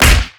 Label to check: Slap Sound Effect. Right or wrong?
Right